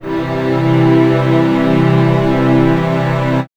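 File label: String Ensemble major c2; TrashLibrary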